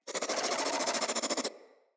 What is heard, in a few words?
Infested Crawler Attack, Enemies; Crawlers